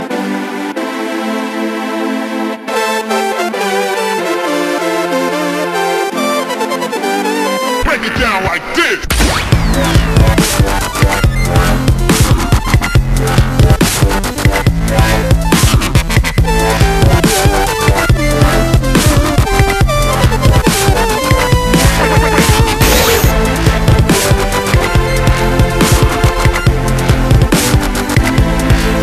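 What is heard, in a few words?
Nokia Ringtone Remake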